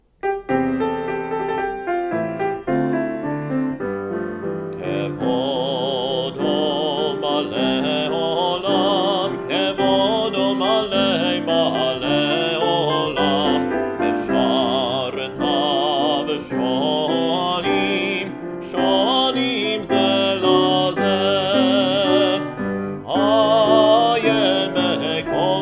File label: Kvodo